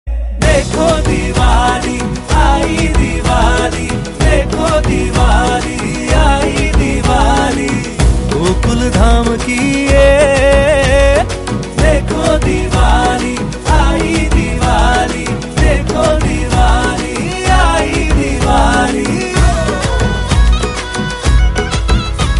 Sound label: Happy Diwali Song Ringtone